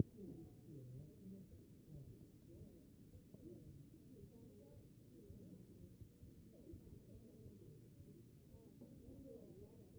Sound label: CHEST pos4 B MODE